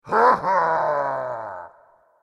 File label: bandit scream; New Yankee in King Arthurs Court